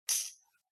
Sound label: call